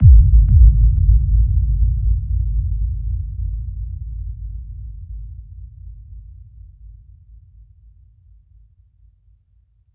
Breakdown FX, Underground UK House & Electro

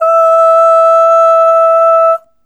Ooh Short E4 Dry; Harmonic Building Blocks

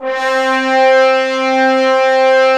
BRS BNSF2NR, Roland LA Composer, Tbns; 90 s Sample CDs

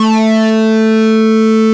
OBERHEIMS

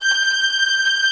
VI TREM FG5-L; AKAI Advance Orchestra Upgrade 97 Vol.1

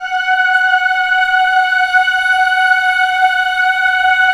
Dream Voice Pad, F#; E-MU Producer Series Vol. 5 – 3-D Audio Collection; 3DSynths; 3DreamVoicePad

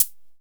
HH, Fricke MFB 501

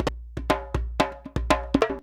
119 DJEMBE, Modern Percussion Loops

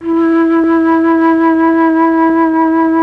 FLUT; ILIO; Propeller Island Legendary M400

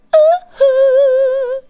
vox, Techno Trance; Ueberschall

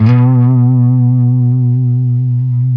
LES PAUL LD, Guitars; Spectrasonics; Hans Zimmer Guitars VOL-1

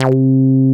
REZ BASS; Trance; AMG - Global Trance Mission VOL-2; SYNTH

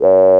AN SYN, SYN & LEAD; SAMPLE WAVE MIXING 2

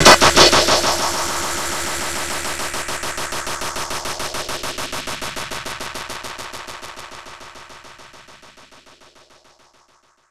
Reload; Zero-G; Soundclash Nu Dance Electro; Acidized Wavs; FX Atmospheres

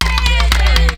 DANCE, ETHNIC JOURNEY, AFRICA; Best Service; Sound Cube

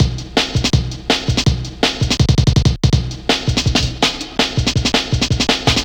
164 Insects, breaks; m8 breaks

